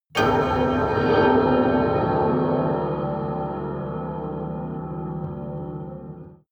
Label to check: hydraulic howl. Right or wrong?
Right